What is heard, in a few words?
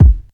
natural kick single hit a sharp key; Duration 0:01; kick drum samples